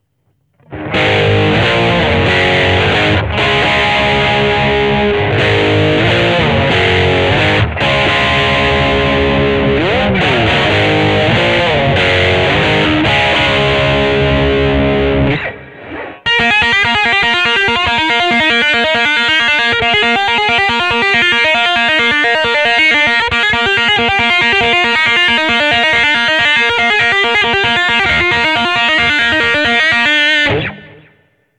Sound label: plexi dist, guitar